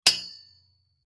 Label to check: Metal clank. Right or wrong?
Right